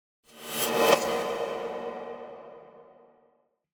Whoosh Metallic Thin Attention Grabber - Double, Lower; Hollywood Edge; sound designer's toolkit v2